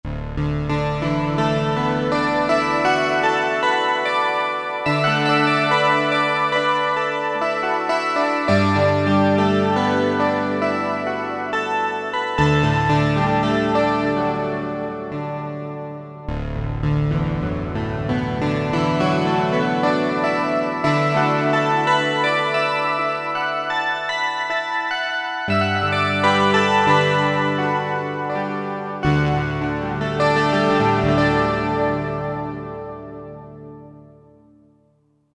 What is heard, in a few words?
MKS80 Sprang, Roland SR-JV80-04 Vintage Synth